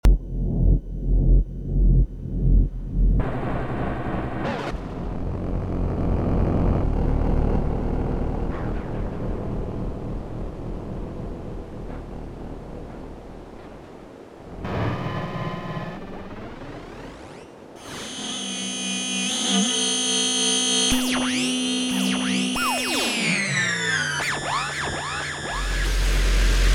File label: Datasound Sea Surface Temperature